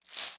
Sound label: Household Broom Single Sweep